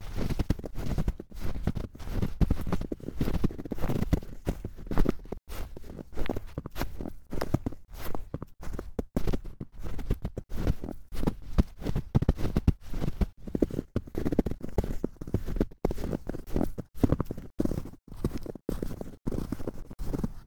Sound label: walk snow